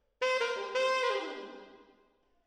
MKH AB, piano

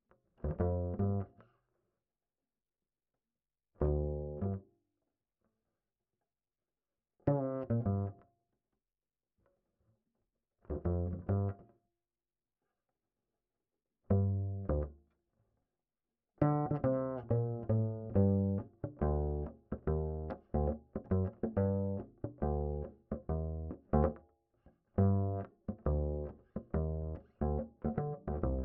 Bass Line

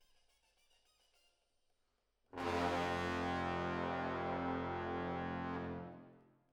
Horns Over

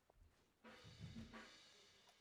Voc

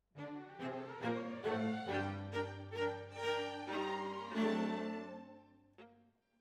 Violas, jazz for piano

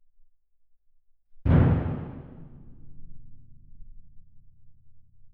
Twin R Perc

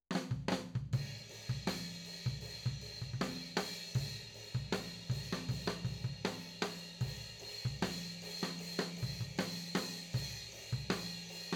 FLOOR

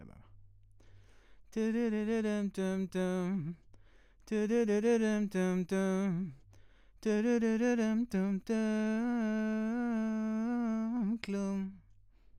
VOX

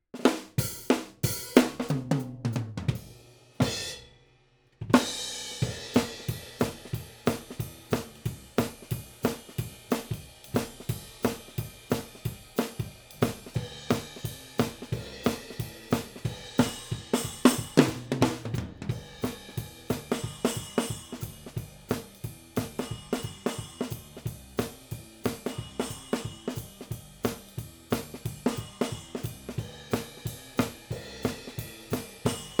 OHs

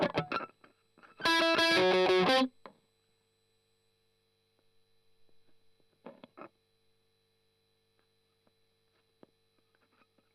Strum Octav

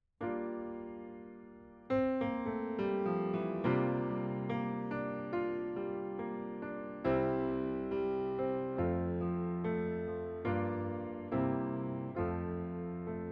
Piano B

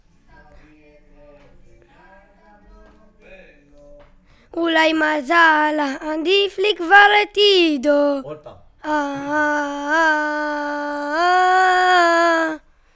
VOC

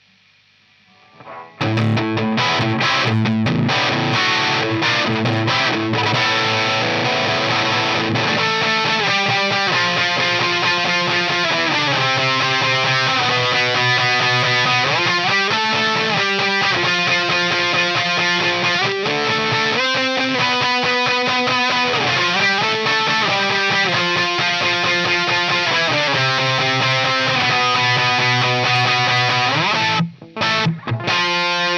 Gtr

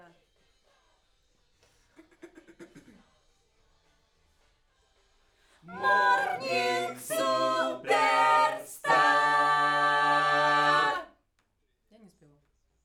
Backs AKG

